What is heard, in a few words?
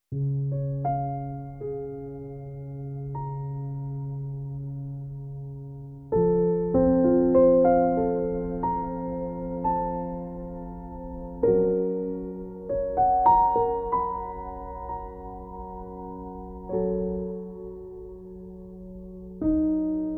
(Arr. For Piano)